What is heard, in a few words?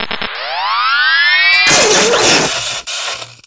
stun; Cosmic Rage